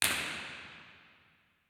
IR Auditorium

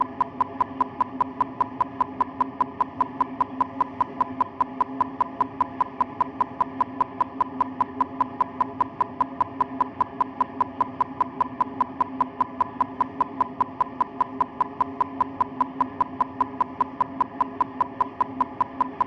burst 16 ms; Da Sorg1 a Mic su Guida Sorg2; LaSpezia; CESI

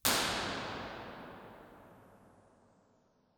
IR fonometro 10m; Palazzo Cusani

